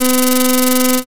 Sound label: slot spin